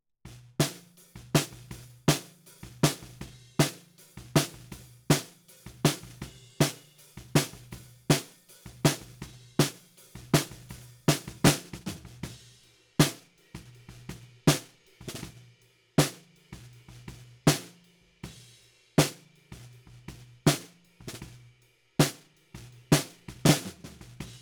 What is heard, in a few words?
Snare Bottom, 160 BPM